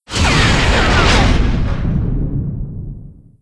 laser

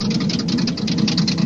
rough engine

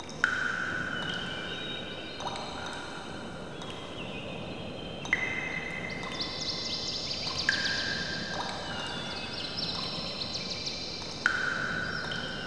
cave

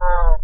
otter

